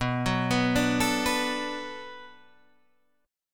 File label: B Minor 7th B, chord; Bm7 arpeggiated